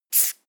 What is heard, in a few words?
marker line short heavy